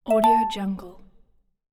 message ding ui

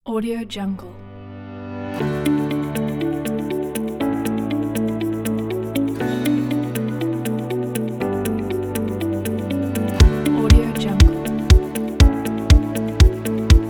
positive uplifting ambient

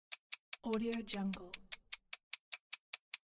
stopwatch

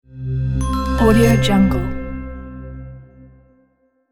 logo touch

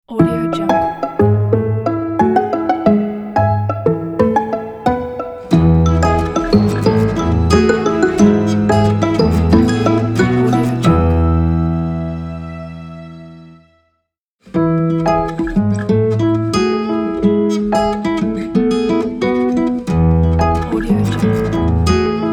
the upbeat folk ident

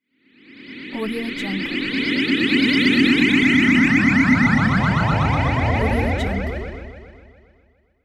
energy pulse

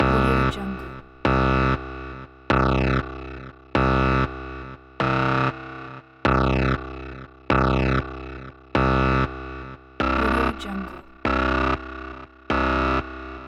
alarm; blackdragonfx

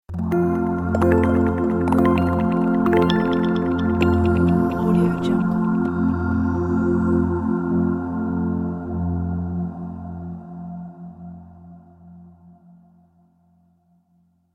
weightless intro ident

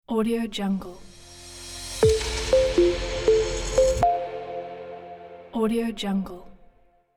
technology logo

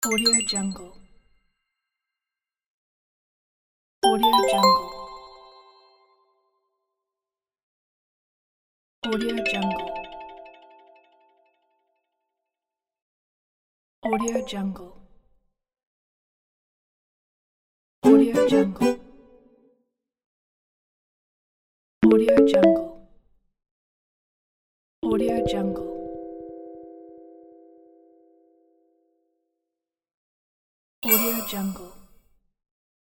musical interface

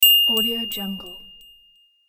ding

